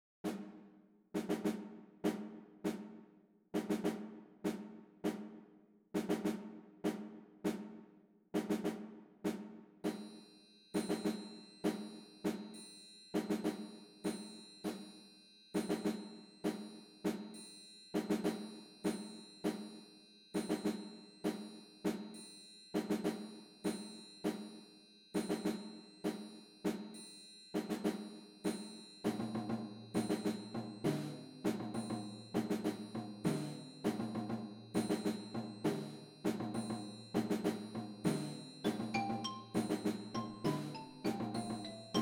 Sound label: percussion